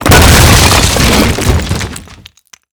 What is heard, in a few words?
Projectile Sounds; Red Orchestra 41-45